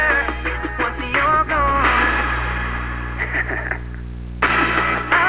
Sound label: laugh